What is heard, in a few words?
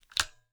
harris bipod down, weapons; accessories; garrysmod